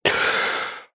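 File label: human male inhale breath in through mouth; zapsplat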